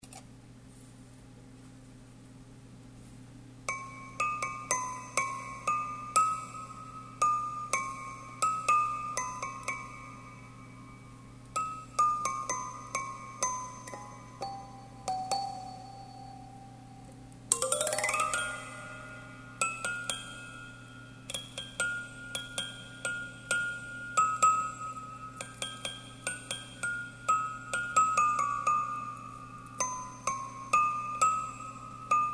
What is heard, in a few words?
huaer, shuqin